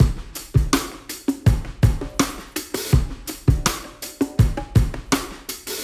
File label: Live Drums